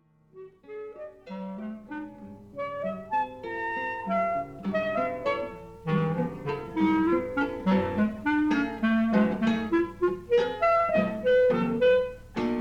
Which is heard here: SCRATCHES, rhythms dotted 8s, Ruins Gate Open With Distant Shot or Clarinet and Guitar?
Clarinet and Guitar